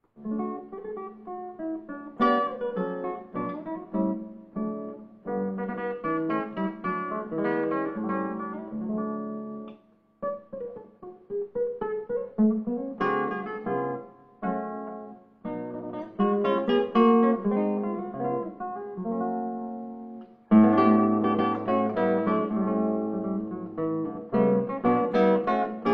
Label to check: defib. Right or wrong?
Wrong